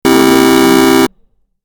games retro car horn

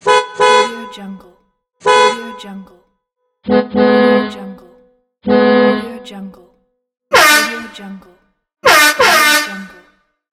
car and truck horns

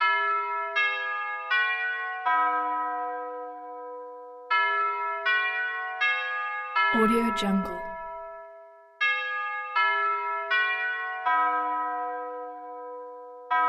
doorbell westminster quarters tubular bells; blazznet

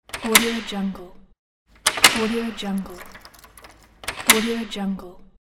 key unlocking a door

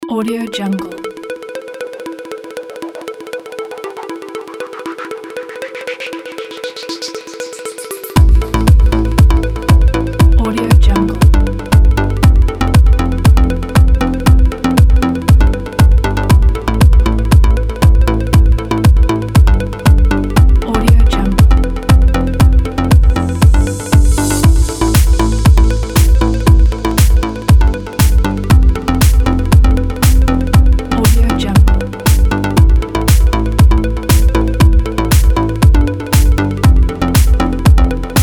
night breeze

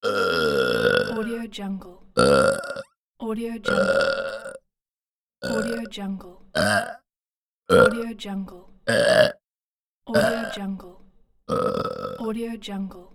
human burping

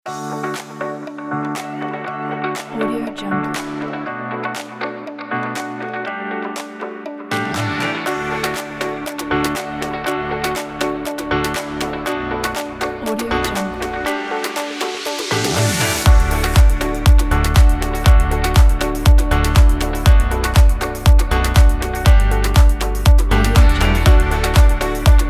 upbeat summer fun

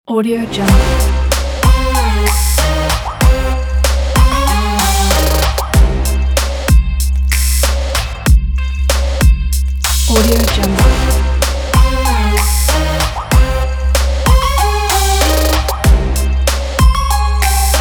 trap trailer

